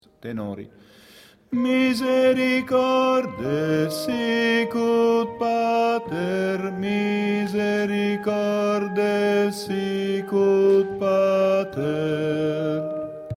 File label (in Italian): Rit Tenori